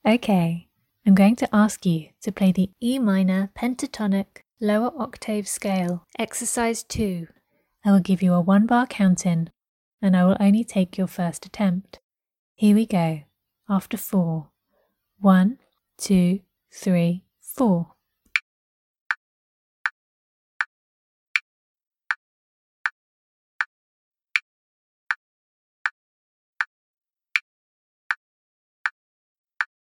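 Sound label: e minor pentatonic lower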